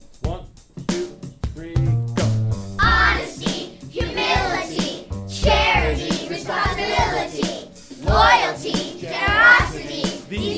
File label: rap; st thomas virtue